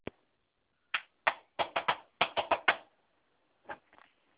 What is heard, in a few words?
claps